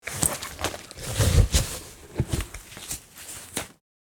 Bulletproof vest put on cloth rustle; Military Equipment

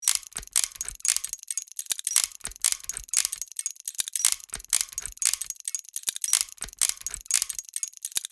Compact machine running loop; Industrial Machinery; gfxsounds

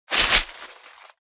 Paper folding swish

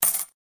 Money in the pot

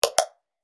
Futuristic digital switch turn off

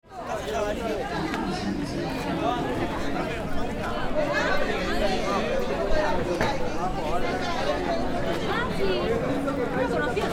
Crowd in a museum at an exhibit

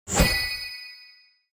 Designed whoosh transition

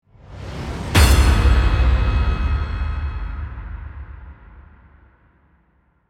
Dramatic scare impact suspense hit

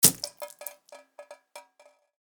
Blood or gore splatter glass surface

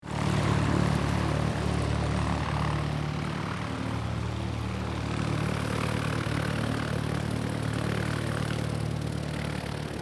Light aircraft take off distant perspective; airplane

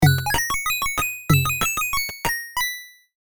Retro game arcade level complete you win; level up

